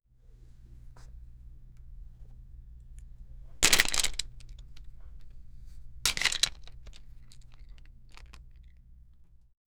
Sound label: rope tension; strained